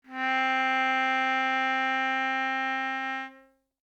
Hohner melodica sound

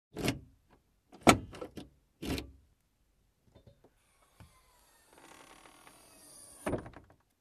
Unlocking Car with key

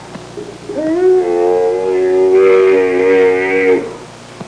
Miscellaneous loud bleat

